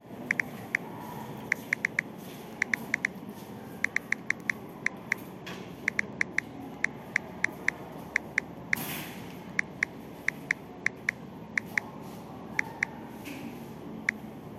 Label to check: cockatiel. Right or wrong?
Wrong